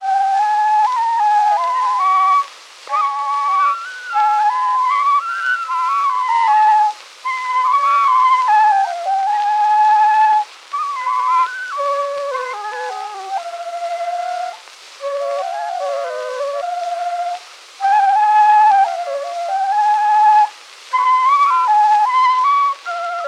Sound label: Beijing opera; Xiao Kai Men Little Opened Door of Beijing opera